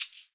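shaker leg, Synclavier Percussion, World & Orchestral; Synclavier Percussion 2; ILIO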